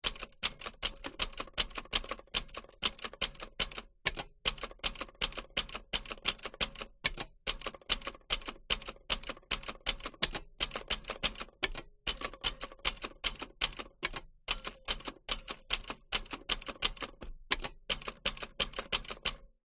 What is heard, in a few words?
office typewriter typing slowly rear close vintage 1960; lemair helvetia